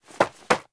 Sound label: run, monsters, cave vulture; Fate The Cursed King